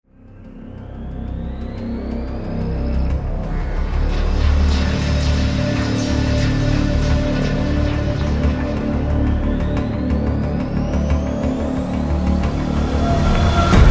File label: Ambient Track For Menu; Deadcore